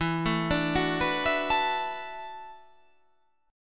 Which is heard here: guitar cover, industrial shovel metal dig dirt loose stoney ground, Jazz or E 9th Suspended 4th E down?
E 9th Suspended 4th E down